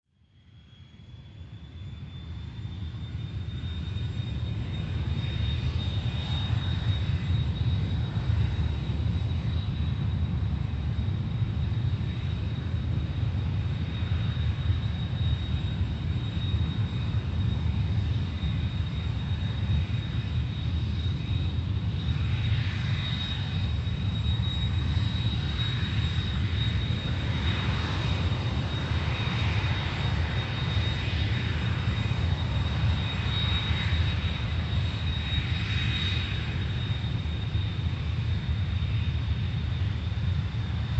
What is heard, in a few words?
Airplane Jet Idle Ambience, A aircraft jet engine running.; Can be in-flight or stationary on runway